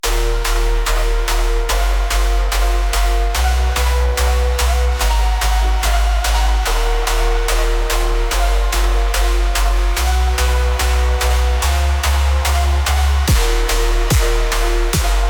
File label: bass